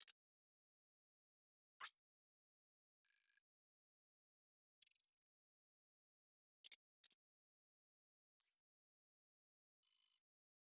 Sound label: computer beeps; cross-code